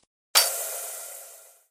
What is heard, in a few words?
grenade gas delay